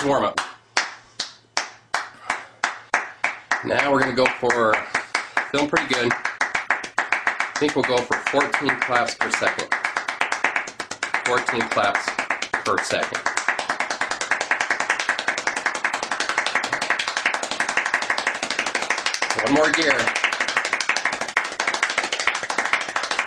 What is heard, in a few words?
Fast Clapping